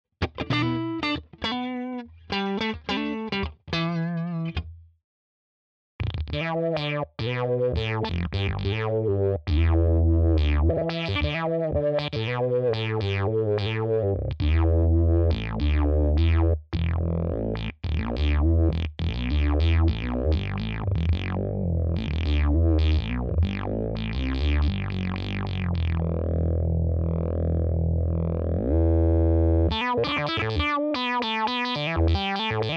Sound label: OSC Synth